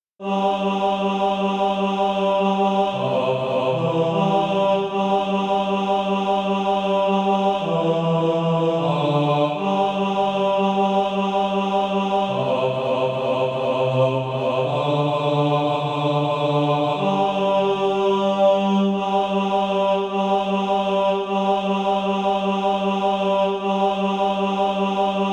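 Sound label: bass, Practise Music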